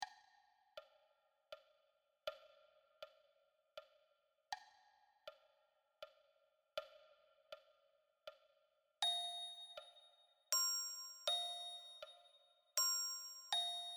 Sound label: Glockenspiel